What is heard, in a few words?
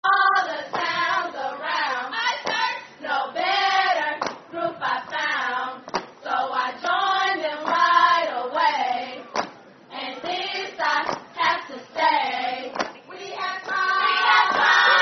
Sorority Song; AKA